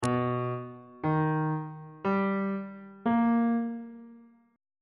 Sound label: c flat augmented major 7th chord intervals